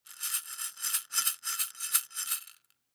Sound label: Baby Rattle Shake Shaker Beads; Foley Baby Rattle Toy Shake Shaker Beads; Miscellaneous Toy; Blastwave